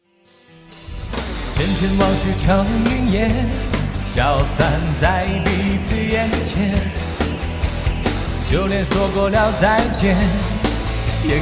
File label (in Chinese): 翻唱摇滚类